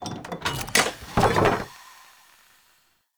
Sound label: Animal Rescue Cage Open; Encounters